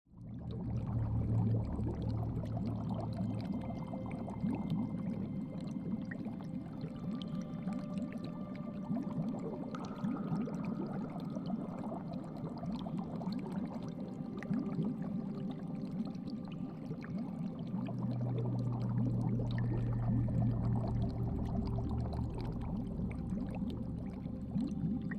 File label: aquarium ambience sounds